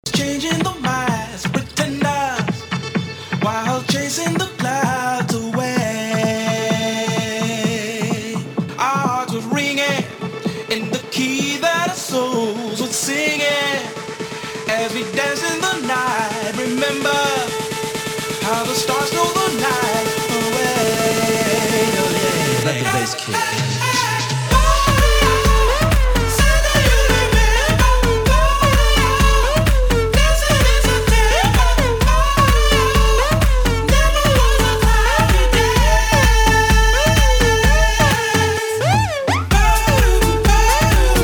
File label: Classic Peakhour Banger Mash Up